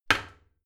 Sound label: toilet seat close heavy; haus-garten; badezimmer